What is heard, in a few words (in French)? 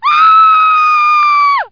cri; bruitage; lara